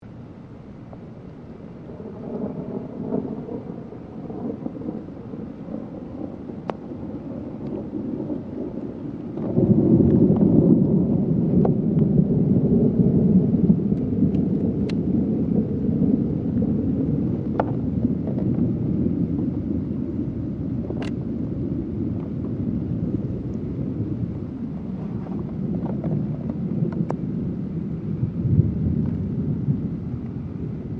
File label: thunder